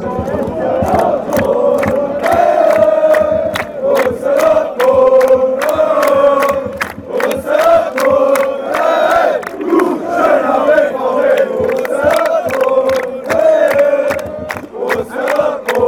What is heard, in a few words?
our bvb fanchants